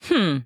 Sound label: Hmmm; Anna